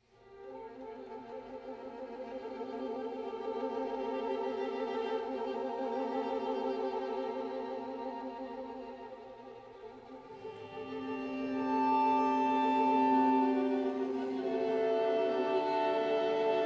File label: String Quartet; No. 1 opening 3rd mvt